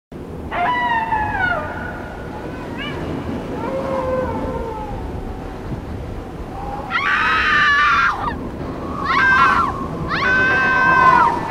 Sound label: Werewolf